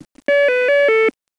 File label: ring pattern